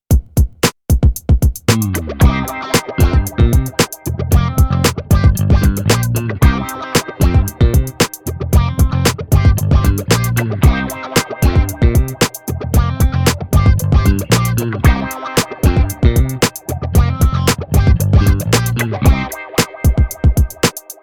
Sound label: DI REAMP